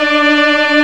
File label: Organ, Barton Bold&Bras